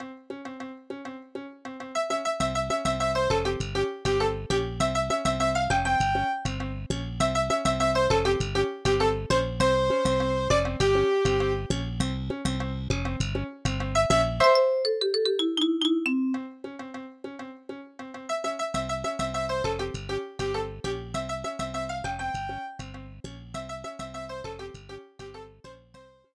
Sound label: Roland SC-55; SimCity 2000